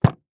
Impact Wood Hit Ground Thud

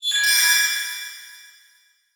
Title Transition Logo; Production Element

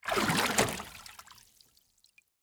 Liquid Water Water Splashing In Plastic Tub

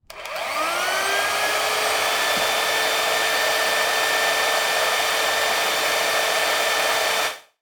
Household Mixer Turn On Medium Speed

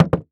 Skateboard Hop On Wood; Movements; Sports Movements

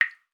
Liquid Water Drop Single

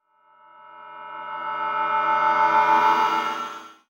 Transition Stab Dark Bell; Production Element; Title Transition; AdobeCC

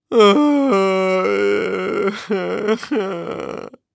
Human Male Crying Whiney Sob

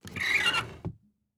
Drawers Dresser Drawers Open; household

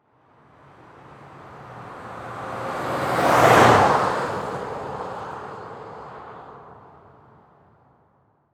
Truck GM Envoy Drive By Medium; transportation

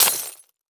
Impact Ax Hit Glass Debris Beer Bottle; Impacts

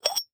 Household Jar Glass Jar Open

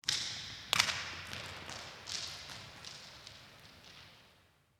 Impact Rock Drop With Echo; Impacts

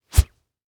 Foley Metal Saw Whipping

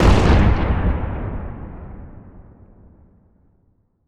Explosion Military Tank; Fire and Explosions

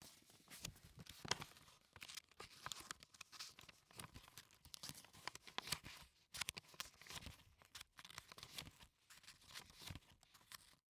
Household Paper Thumb Through Papers In Folder

